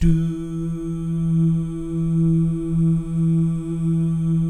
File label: "doo" guys, Vocal Jazz Fx